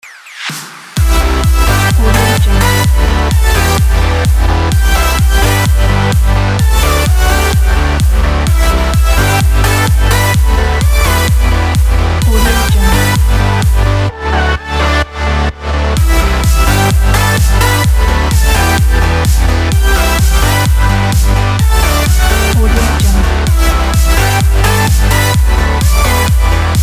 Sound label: edm background